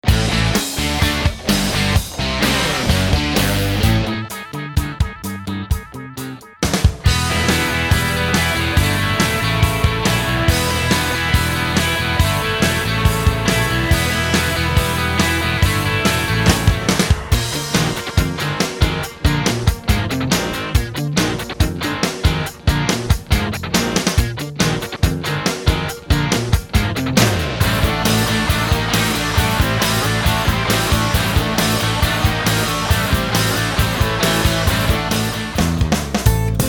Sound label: rock medley cues